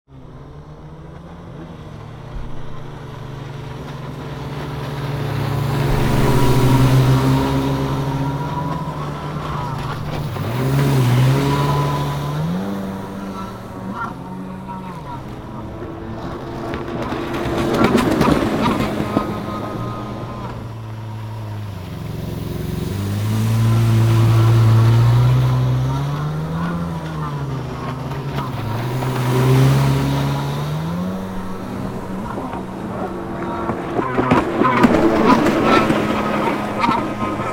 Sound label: Jet Ski Kawasaki SX R Stationary; NoiseFloor-FX